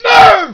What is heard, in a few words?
moo